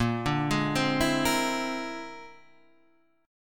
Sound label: Bb 9th Flat 5th Bb, chord